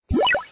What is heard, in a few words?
get bomb; plane fighting